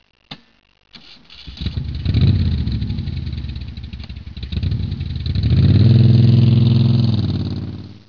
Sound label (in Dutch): starten, moto; Geluidsfragmenten